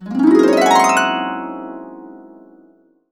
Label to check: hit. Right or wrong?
Wrong